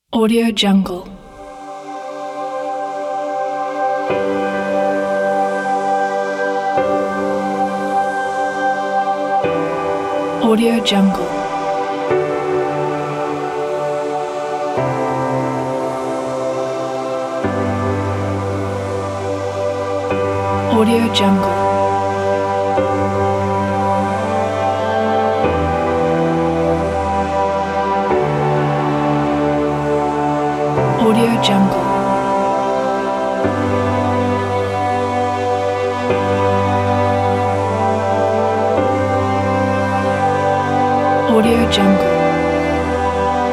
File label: sentimental romantic